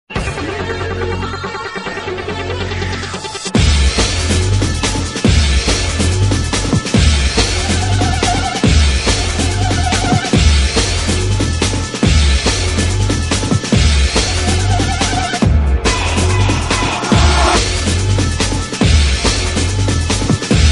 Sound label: Instrumental